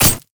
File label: basic attack; LoL; fiora